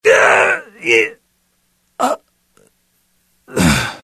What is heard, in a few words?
death; mohaa; dialogue